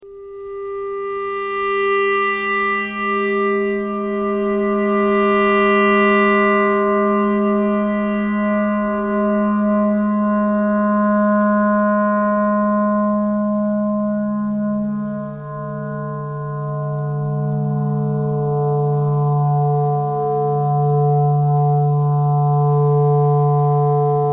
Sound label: clarinet clarinet thread noise